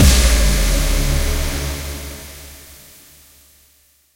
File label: stinger; Mirror's Edge (2008)